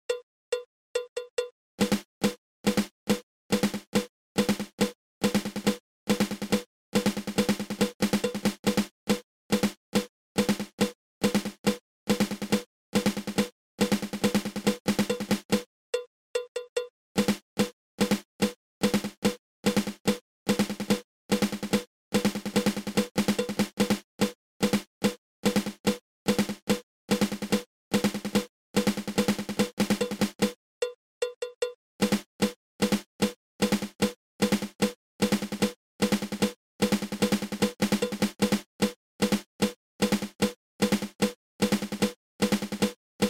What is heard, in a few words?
Flamacue